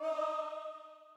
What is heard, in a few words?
choir